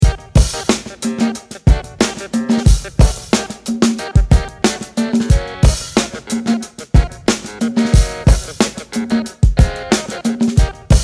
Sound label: Mozzarella BACKING TRACK, 91 bpm